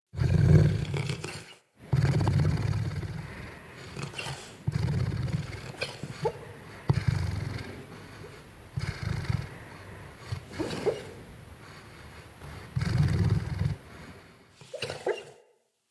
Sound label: Wolf Idle Sleep Yelp; Animals; Vocals